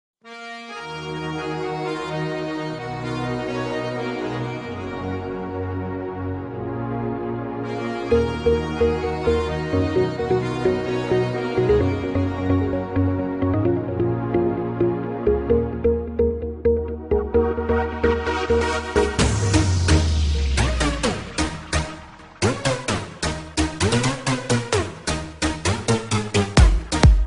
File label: floor music